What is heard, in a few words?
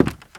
STEPS Wood Creaky Walk; UltimateFishing